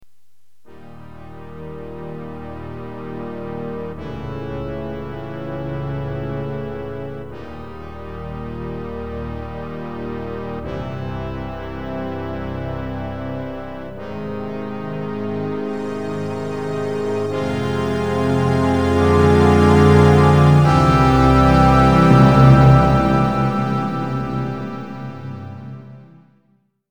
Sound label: dramatic buildup